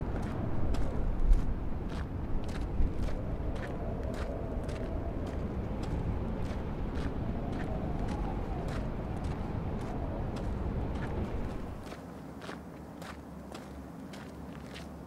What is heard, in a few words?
audio sample walking through badlands; Cyberpunk 2077